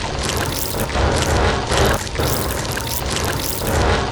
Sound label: Leviathan Crawl; A Robot Named Fight